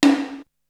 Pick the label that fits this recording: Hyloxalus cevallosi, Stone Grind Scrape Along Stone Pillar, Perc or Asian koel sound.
Perc